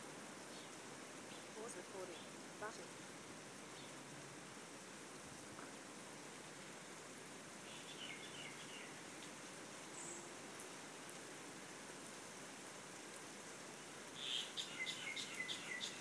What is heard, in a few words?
rain